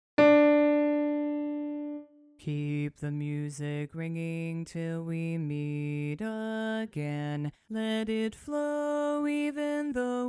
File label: Bass Alone